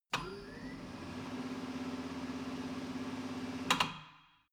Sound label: tire changing machine turning platform latch long